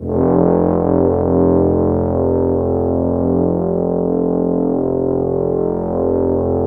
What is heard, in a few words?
Trombones, F1; Standard Instr